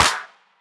Claps; Vengeance; Essential Clubsounds Vol.4

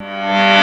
PIA, PIANOS; USB Soundscan vol.02; AKAI

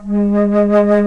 WINDS & BRASS

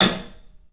HAT, CLUBHAT, 3D Drums; 3D Sound

